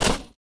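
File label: footstep Dirt; droids robots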